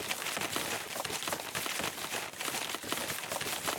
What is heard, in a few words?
Footstep Foliage Monstera Loop; Sx Footstep Foliage Monstera Loop 01